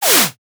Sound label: whip whoosh